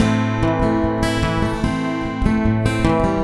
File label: Picking Am 77